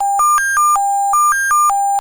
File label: Nextel Ringer